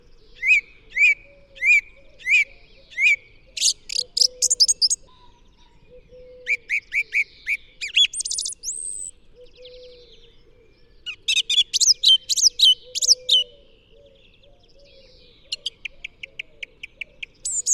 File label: song thrush